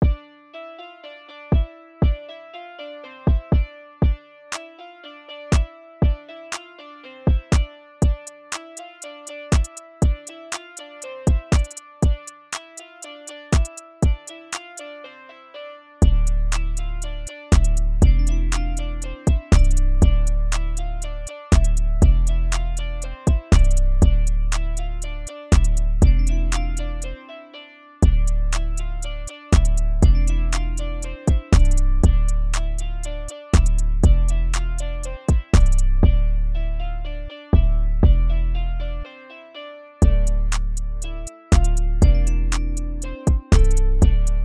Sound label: Instrumental BEAT CHILL BEAT HIP-HOP BEAT